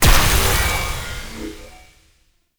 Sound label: Shock Globe Explosion; Warframe; Eximus